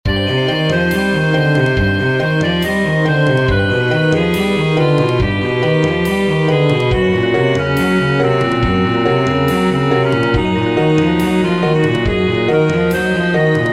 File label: locrian